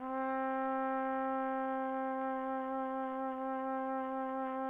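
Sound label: trumpet; Roland - LA Composer vol. 3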